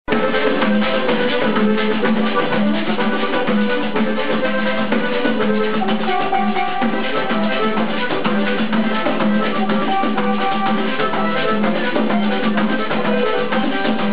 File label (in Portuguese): acordeon, pandeiro